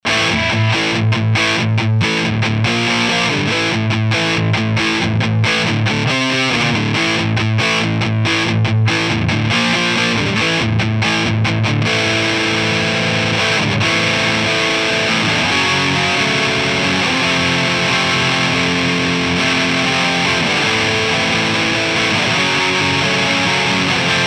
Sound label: AMP DIst